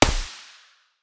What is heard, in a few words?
p90 silencer mono distant, weapons